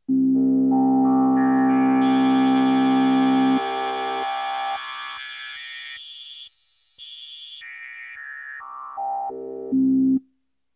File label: Vocorder; Filterbank Cutoff LP, HP, BP: external chord via Synthese input; flame2